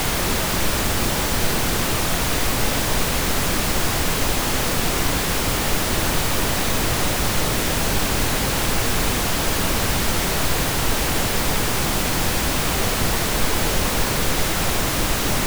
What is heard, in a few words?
pinknoise 20dB